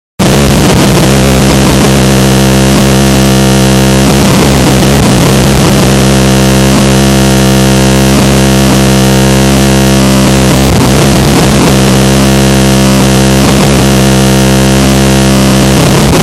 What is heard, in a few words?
Morning Noise Broadcast